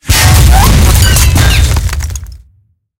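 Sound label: tank hit high-explosive mid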